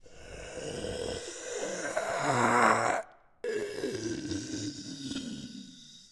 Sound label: zombie say; minecraft; TBMPack